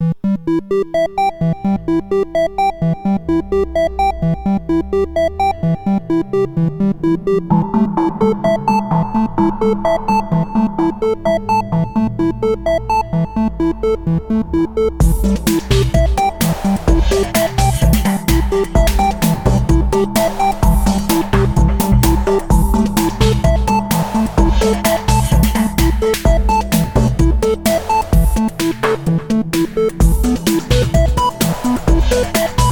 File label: arp notes; Arp Notes #2; midibox sid